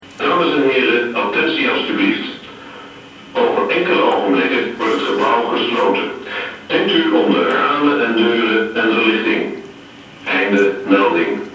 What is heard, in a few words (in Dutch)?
harmonie